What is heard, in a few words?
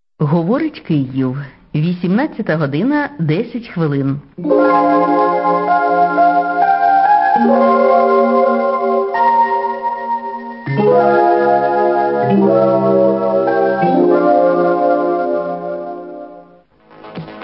interval signal; ur vo kyiv